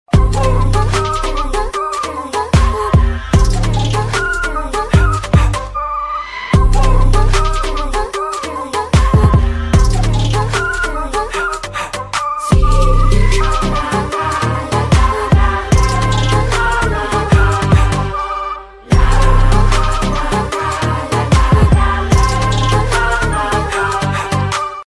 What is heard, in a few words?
bgm; romancham